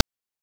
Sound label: PITCHED NOISE; NES DPCM; MIDINES